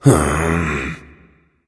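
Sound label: non-verbal sigh; smite; voicepack; houyi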